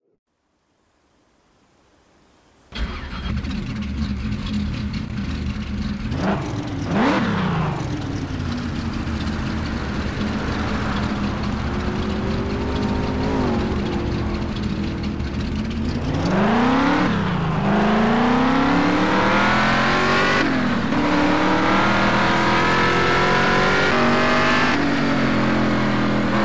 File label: dodge challenger 426 hemi; TDU-1